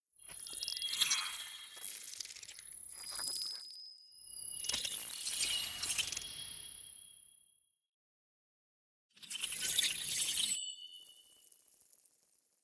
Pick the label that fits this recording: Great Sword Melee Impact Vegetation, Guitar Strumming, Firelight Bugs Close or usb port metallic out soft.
Firelight Bugs Close